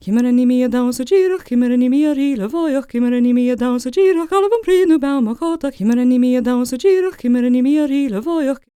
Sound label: GAELIC, World Voices, GAELIC FEM; ILIO; Vocal Planet